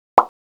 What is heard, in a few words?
pop; افکت صوتی